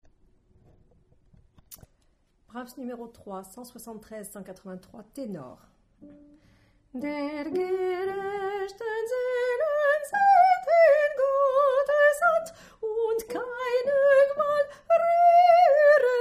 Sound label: Tenor